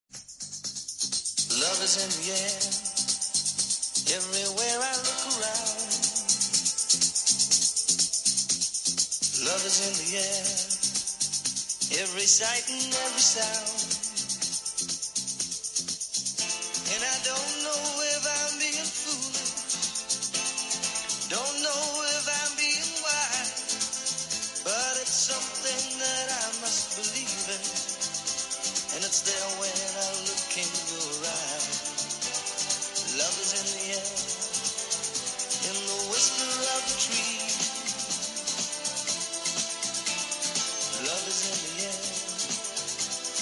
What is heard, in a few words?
laugh; breadradio